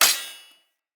Short Sword Thrown Impact Armour

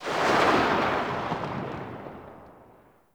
Tail, Weapons; Warframe; Braton

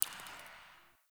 Derelict Interior Water Drip

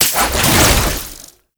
Wall Climb Dodge Start; Warframe; Oraxia

